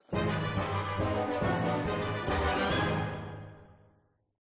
Kungfu Fight Win; Minigame; Ice Age Village; Android

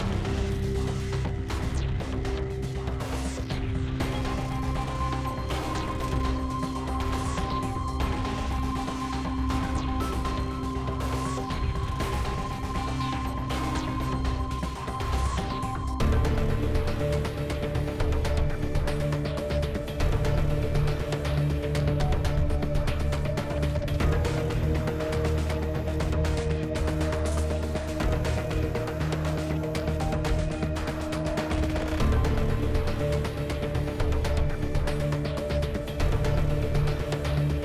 CINEMATIC 16 bar Loop C min 120 bpm; Stellatum; ps4

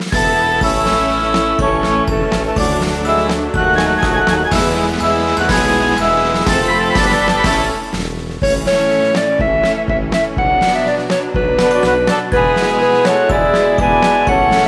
Music Menu event

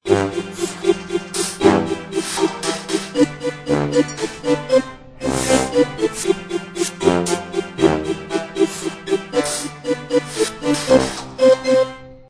Chopsticks; Where's My Water; Android

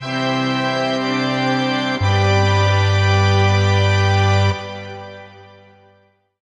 Jingles Organ; gamerip; Selenophobia

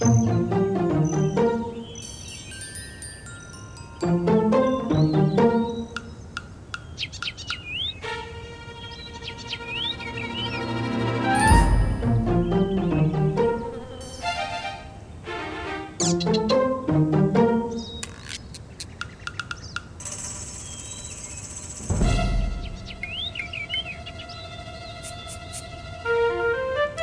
Tree

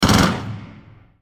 Enemy Weapon Machinegun; Godzilla Smash3

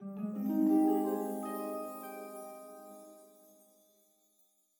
Stinger To map

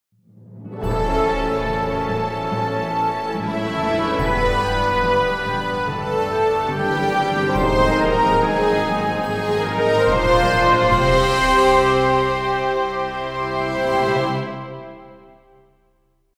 Jingle Positive

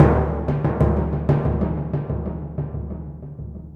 Timp; Torchlight III; PS4

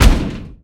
common bump

